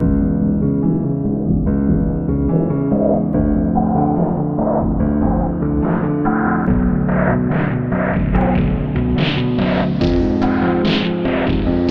funny Resonanse 100 Low Pass Filter Automated Audio Effect